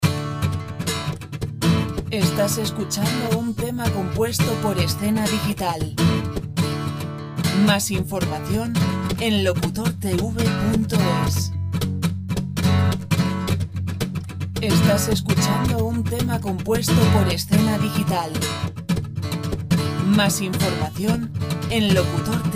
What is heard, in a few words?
folk